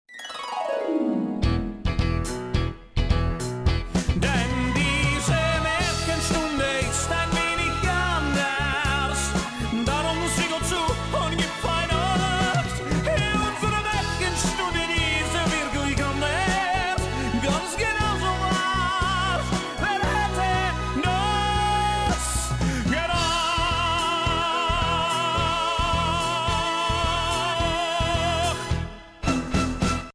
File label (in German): Maerchenstunde Intro